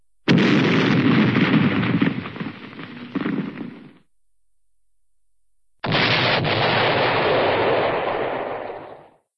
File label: explosions boom, ka boom; cartoon; hannah barbera cartoon sound fx